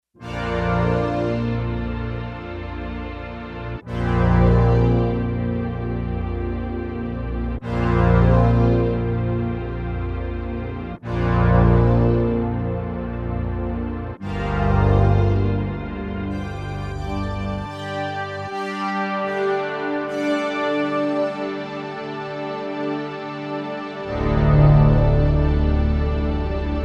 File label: BGtr Str Stck, synth